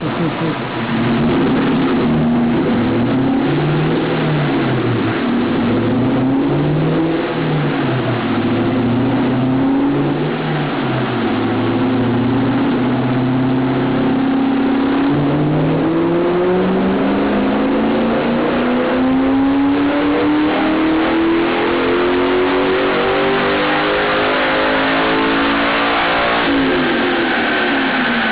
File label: dyno; crown vic muscle